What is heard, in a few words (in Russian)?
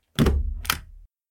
zvuk sshivaniya bumagi odnokratnij gluhoj